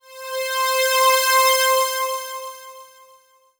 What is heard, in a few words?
Korg MS2000 Hi Melody C5